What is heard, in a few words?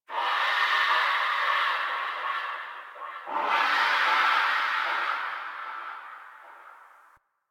Venus Far Distant Creatures; Ambience; Warframe; Venus Open World; Venus Landscape